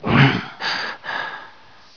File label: gasp; sorlag